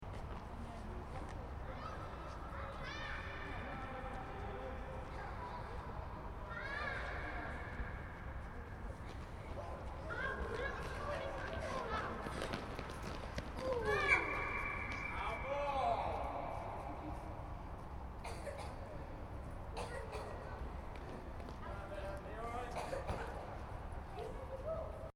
Sports Hall, Interior: Ambience, Kids Five-A-Side Soccer Game, Reverberant; Stuart Duffield